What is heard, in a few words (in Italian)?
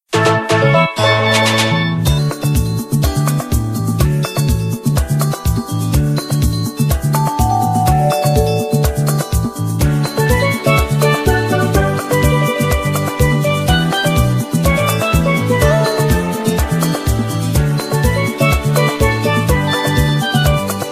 musica; MK8; DS Spiaggia Smack